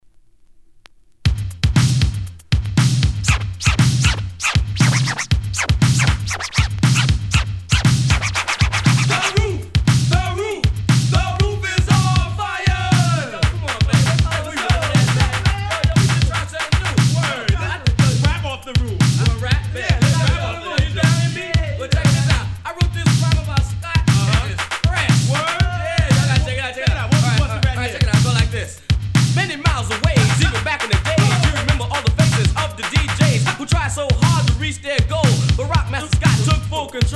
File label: Scratchin'; Reality Records